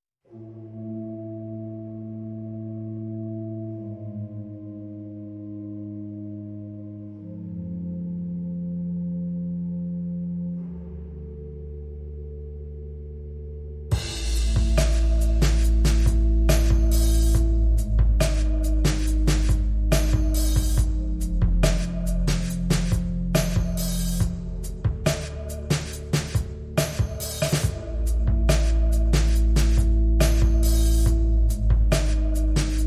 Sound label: Drums and Bass Mix